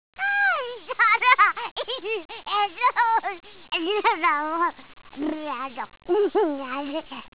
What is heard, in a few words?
– , 8bit; Baby Nameless – Giggling; Mission Hill